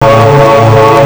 concerto

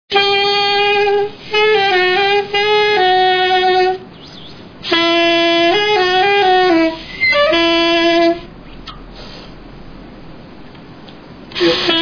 sax

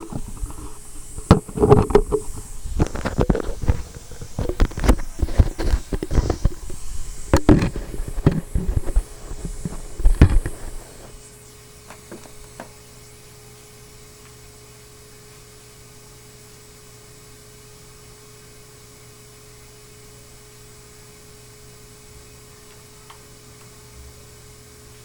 ritmic

